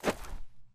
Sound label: sand footsteps; zps; Foley